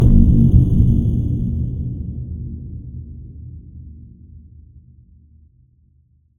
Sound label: HIT SciFi Low, Cinematic Hits Designed